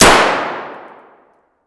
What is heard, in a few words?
ak74 raw indoor distant; Escape from Tarkov